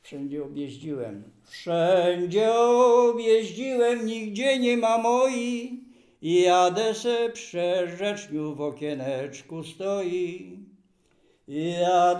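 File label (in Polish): przyśpiewki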